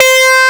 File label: MOG LEAD, E.POP